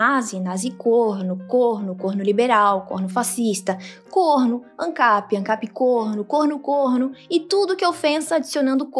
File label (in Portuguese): corno suavemente